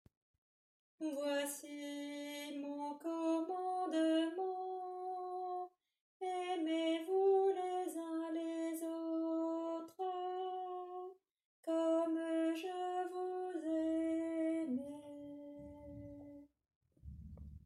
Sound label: Alto